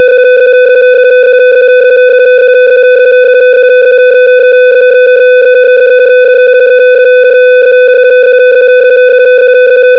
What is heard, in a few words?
BPSK31